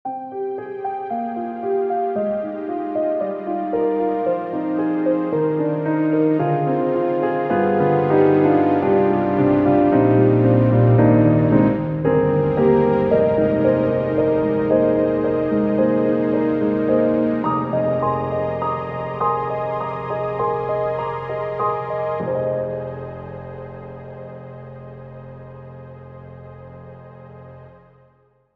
Analog String Machine; Steinberg HALion 7; Verve

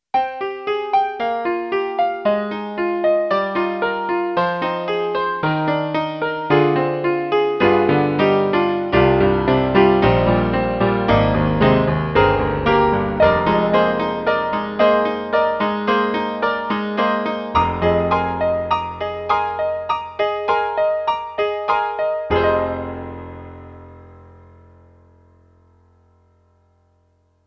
Proteus1 PopRock WinstonGrand

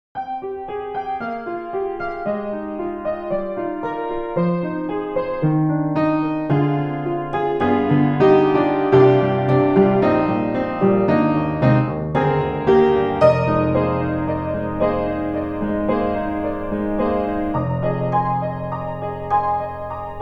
Saloon Piano Hallway; VI ONE

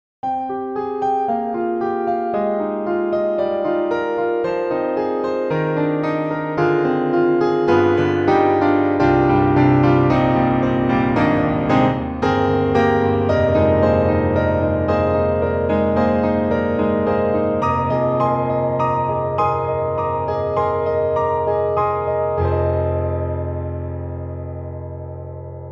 Pianoteq Grand C1 deep; MODARTT S.A.S.

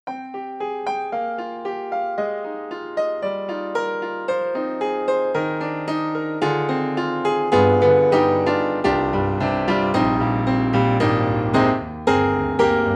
KORG KRONOS 2 Japanese Grand

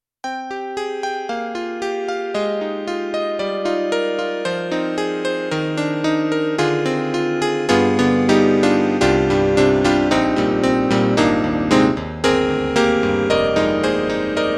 Yamaha MU90 Electric Grand Piano KSP

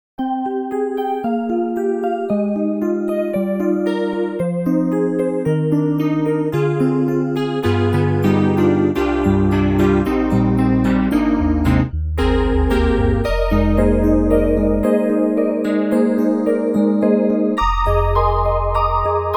Hybrid Piano; EastWest; Quantum Leap Goliath; GS-1

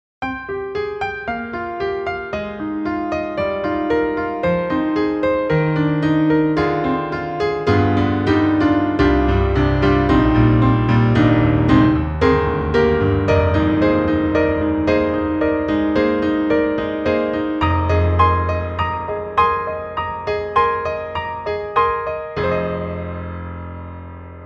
Truepianos Emerald Country; 4Front

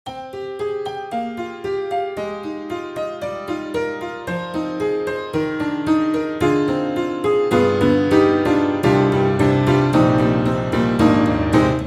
Upright Pianos Tack Piano Electric Tack; Synthogy; Ivory II

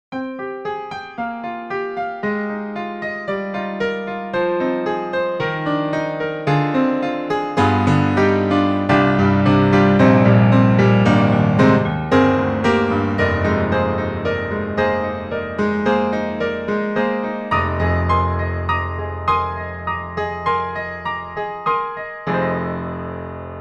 Truepianos Sapphire Wide; 4Front

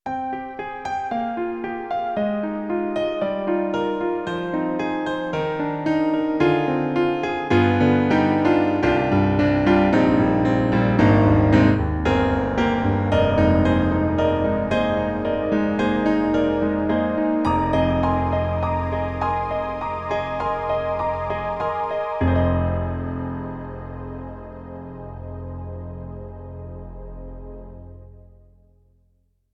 Grand + Pad; Roland; SonicCell

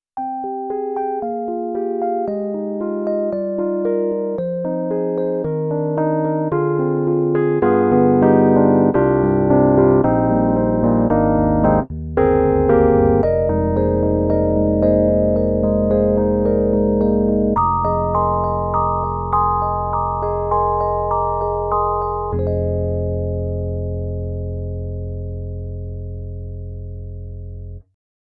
KORG KROME E.Piano Mark I RandB

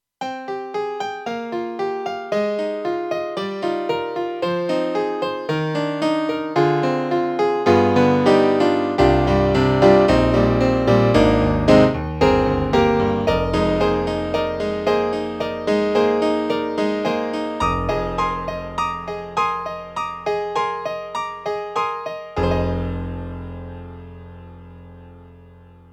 CASIO GZ-70SP PIANO

